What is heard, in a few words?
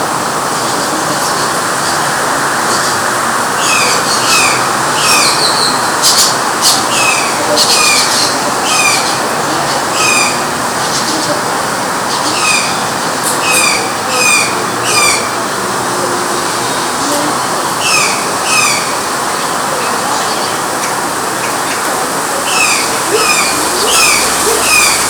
Psilorhinus Morio; Aves; Passeriformes; Corvidae